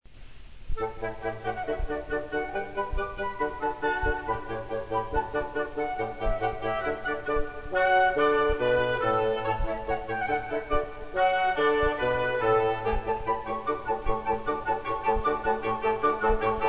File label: Gallop, Bassoon Trio